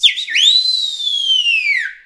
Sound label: Rap Vox